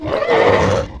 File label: Claw Mutant Death; Playable Tutorial Level; TR3